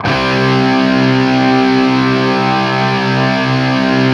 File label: 5TH C looped, Guitar; East West; Steve Stevens Guitar Samples Collection